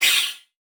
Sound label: Multimedia Internet CD-Rom Flash Wipe Short